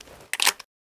load, Spas-12 weapons; load2; Spas-12 Reanimation

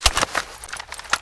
ammo; Interactive PDA Roguelike; rogue game

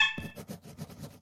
route opened, pda; device; Enhanced Graphical User Interface

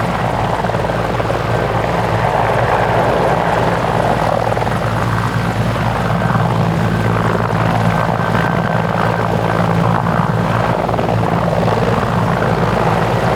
helicopter; Better Helicopter Sounds; vehicles

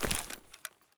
earth, human step; Soundscape Overhaul

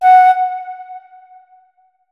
flute notes; Hideout Furniture

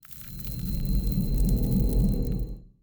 burer tele hold, monsters; Soundscape Overhaul

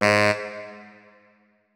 notes, saxophone; Hideout Furniture

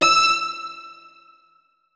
notes, keyboard; Hideout Furniture

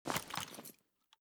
mosin holster, weapons; Mask's Reanimated Anomaly Arsenal

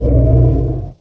growl, Angry Chimera Growls; TBs Angry Chimera Growls

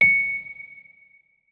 notes, keyboard, electric piano

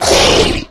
hit, monsters bloodsucker; Soundscape Overhaul

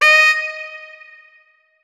notes, saxophone; Hideout Furniture

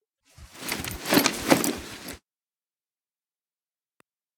light, Vaulting; ledge grabbing; Ledge Climbing Mantling

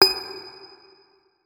notes, guitar; Hideout Furniture

